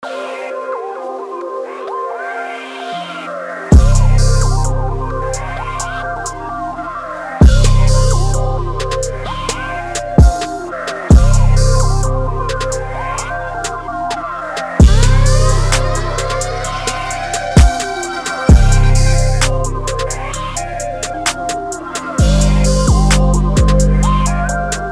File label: 130BPM Trap